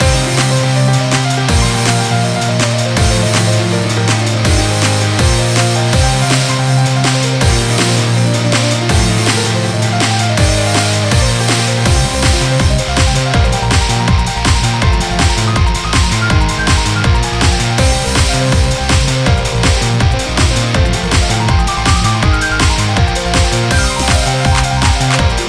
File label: Piano Solo